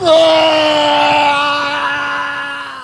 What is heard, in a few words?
aaagh